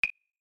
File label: clave